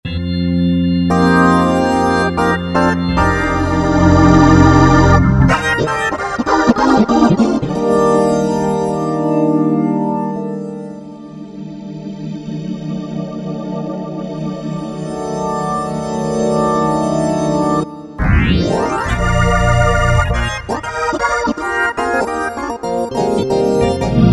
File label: Helios Overdrived